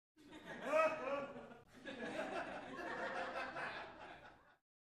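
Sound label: Crowd 100 Short Distant; medium int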